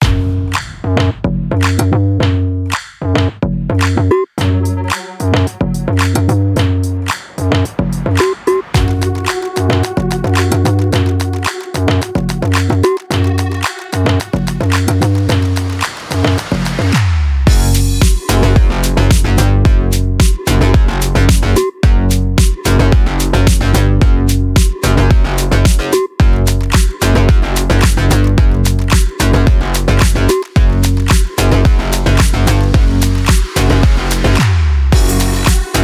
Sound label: abstract sports beat diverse